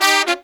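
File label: Pop & Funk Brass, 110 Bbm, right; Best Service ProSamples vol.25; AKAI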